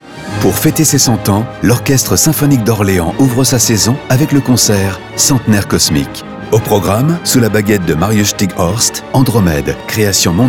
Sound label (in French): orchestre symphonique; orchestre symphonique d'orleans